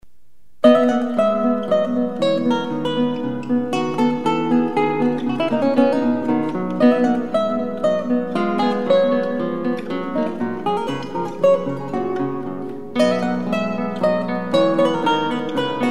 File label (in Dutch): gitaar polonaise